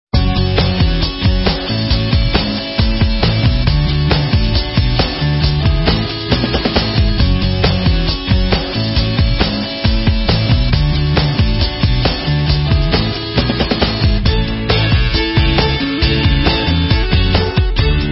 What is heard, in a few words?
bgm; paokuclient